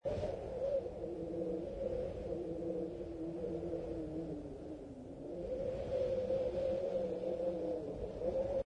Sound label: Wind